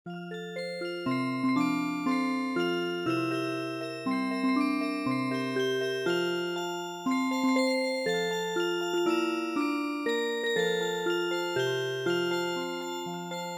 happy birthday to you Music Box

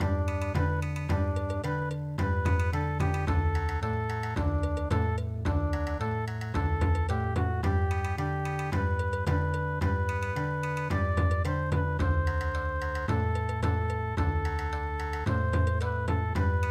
Bon Odori

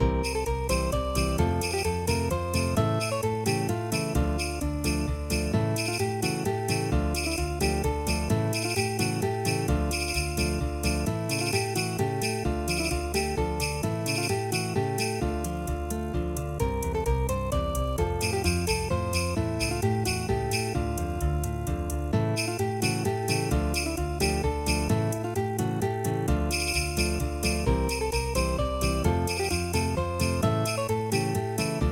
flamenco; La Vidalita

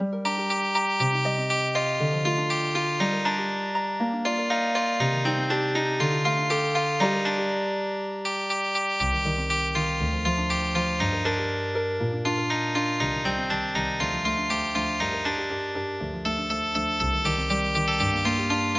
Healing Harp, traditional

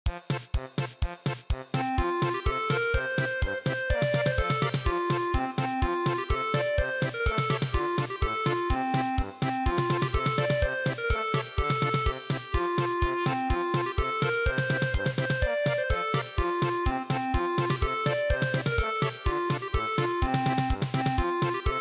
German Polka; dwarf muku

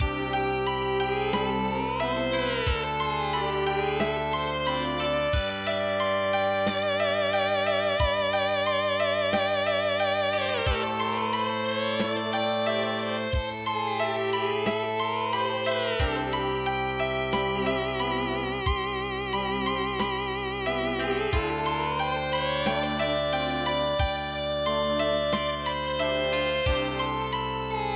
Guizhou shange Erhu Music

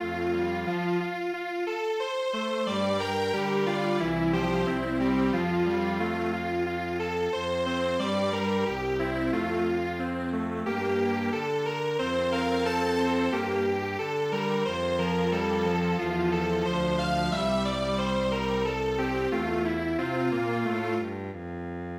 Strings Quartet, traditional; EARLY ONE MORNING

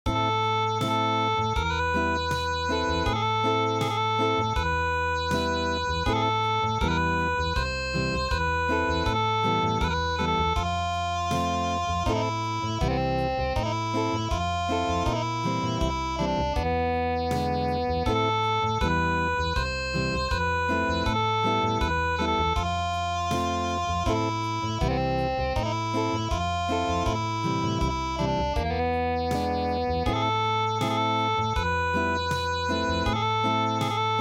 Unplugged, midi; Sakura

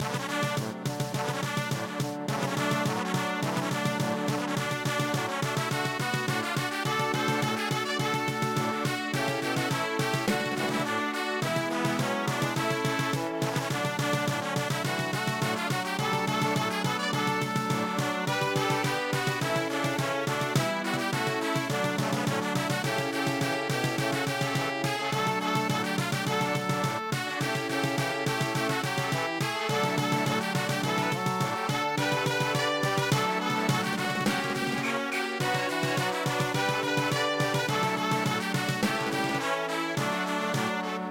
Brass Band March; midi; traditional

